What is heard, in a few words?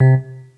Synth